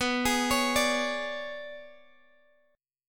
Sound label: B-Minor 6th Add 9th B down Guitar Open F; arpeggiated